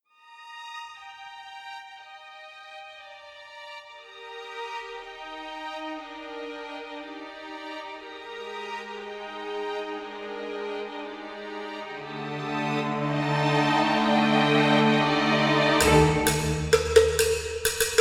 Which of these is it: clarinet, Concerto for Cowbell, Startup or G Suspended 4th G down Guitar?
Concerto for Cowbell